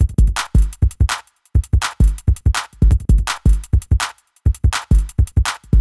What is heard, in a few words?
Beat; CRTIV Shumovick; DaZone